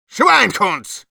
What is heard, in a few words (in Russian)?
negative vocalization, ru; Schweinhunds!; Сволочи!